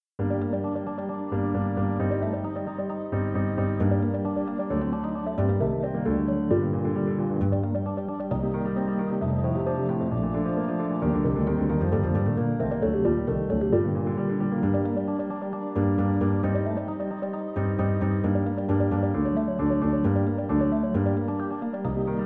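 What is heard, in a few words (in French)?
prélude pour orgue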